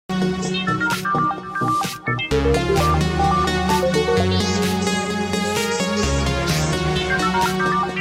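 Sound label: Techno Dual Sms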